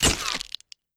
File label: camera flash; Papers Please